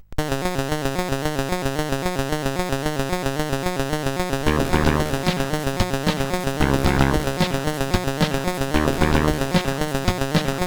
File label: CSG8580R5; hvsc